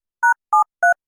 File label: High frequency DOWN; 高周波数の音量を上げ下げできます。; 補聴器; 言葉の子音が強調されますが、上げすぎるとロボットのような声になります; AMP補聴器調整用